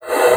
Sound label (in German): LUFT, bassoon; Xsample VOL-6